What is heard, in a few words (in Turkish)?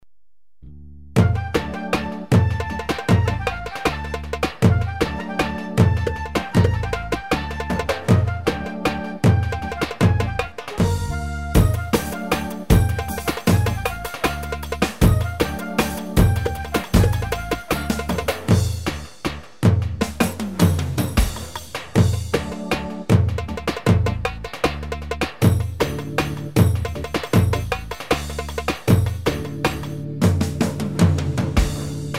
sepetci, ketron ritim